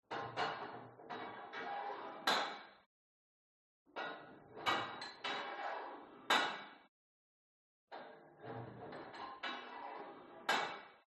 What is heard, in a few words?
GYM Put Weight Onto Barbell Unsteady Reverby; SPORT; smartsound